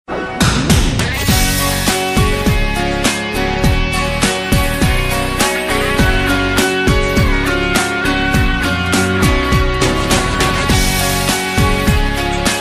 music instrument; classical

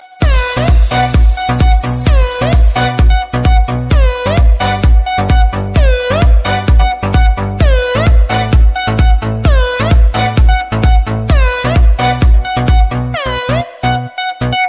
nokia fast new

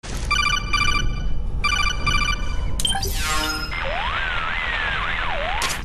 codec; ringtones; games; mgs4

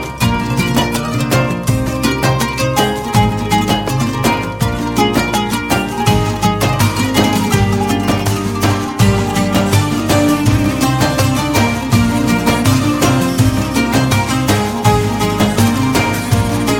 Kurdi Muzika; rojava

